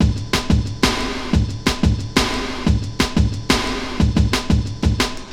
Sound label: Funk Break, Drum & Bass; Fluid Dynamics